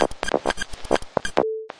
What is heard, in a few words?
132 plink, drum loops and breaks, 131 to 140 bpm; samples-ghost